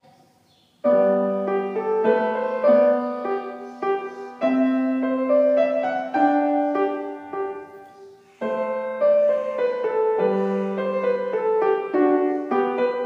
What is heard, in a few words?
minuet bach